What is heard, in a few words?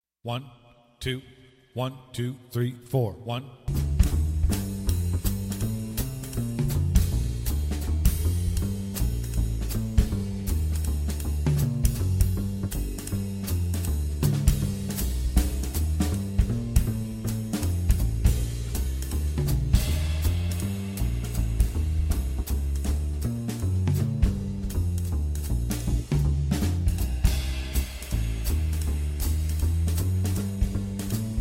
Piano Audition Track